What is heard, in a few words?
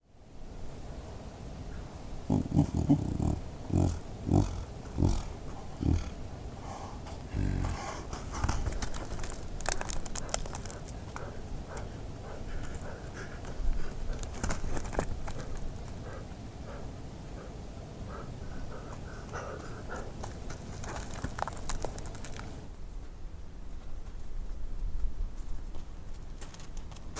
snow leopard chuffle; wilderness songs